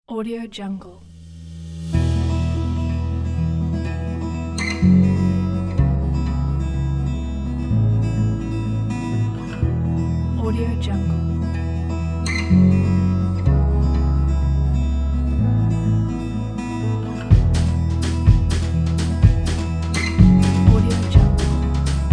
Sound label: inspiring uplifting happy pop folk